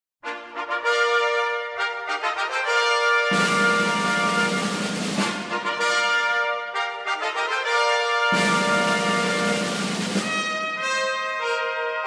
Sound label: Fanfare; Ridout